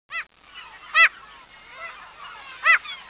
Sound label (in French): mouette